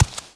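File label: walk right medium, dirt foot; The Elder Scrolls III - Morrowind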